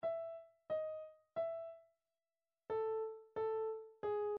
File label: telefon, midi